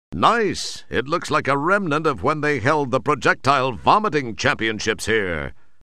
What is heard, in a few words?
Vomit